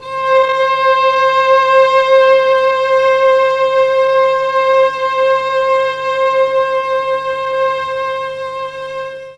C5 300 VIOLA, mellotron; 300 STRINGS

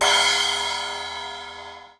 Splash-Soft, hydrogen drum samples

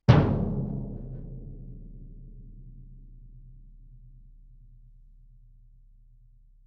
bass drum ff; Struck Membranophones; Bass Drum 3

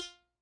Hohner Special20 F normal release F3, harmonica; Aerophones; Releases; Free Aerophones

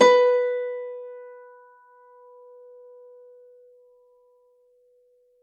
Grand Piano, Kawai, Sustains, B3; Chordophones; Legacy

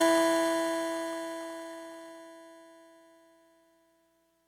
Clavisynth E2, TX81Z; Electrophones

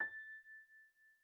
GPiano sus A5, Grand Piano, Kawai; Chordophones; Sustains

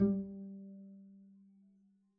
pizz g3, double bass; dsmolken

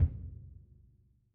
Bass Drum hit

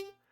Hohner Special20 Soft rel G3, Harmonica, Releases; Aerophones; Free Aerophones

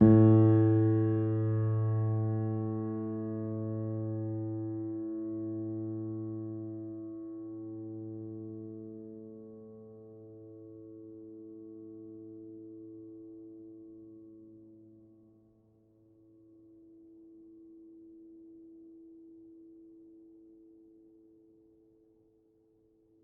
Upright Piano, Sustains, A1; Knight; Chordophones

